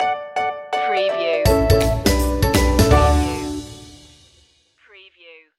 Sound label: happy explainer stinger